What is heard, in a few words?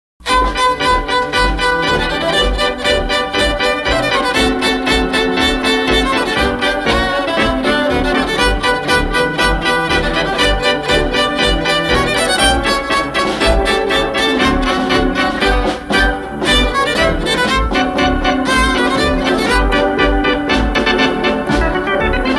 instrumental